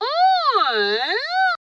formant filter vox hit c, Formant Filter Synthesized Voice Hits